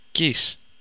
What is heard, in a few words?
kiss